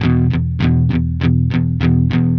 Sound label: Electric Guitar, Loops; Guitars